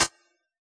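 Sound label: snare drum Elect., Yamaha DJX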